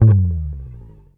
HOFNER, Bass

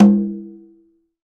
Conga Lo Open; Roland BK-5; Percussion

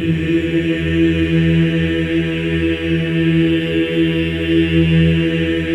E3, Symphony of Voices men; MEN EES OCT

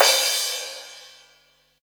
crash L; Zero-G; Chemical Beats; CRASH ZOO

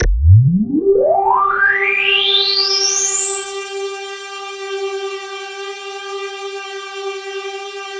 KORG DW8000, Vintage Keyboards; Big Fish Audio - First Call Vintage Keyboards

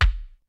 KICK SNARE; Kid Nepro; Elite; KICKS SNARES

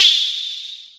Hat O, Hi Hat; Zero-G Club Techno; Percussion

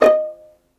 VIOLIN, Synclavier Strings; ILIO

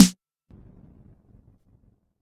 Quasimidi 309, Drums

